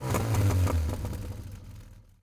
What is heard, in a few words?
GTR crackling; 92 nissan GTR; Zomboid